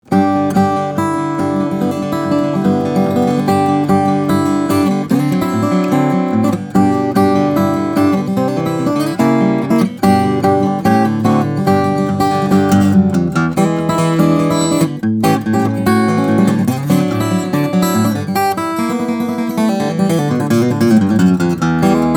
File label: taylor, steel string guitars, flattop; taylor ddsm